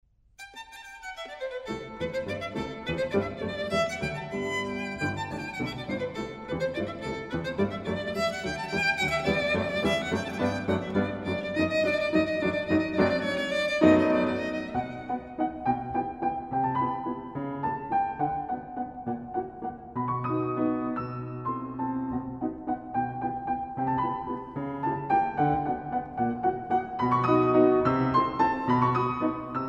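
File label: violin sonata; godard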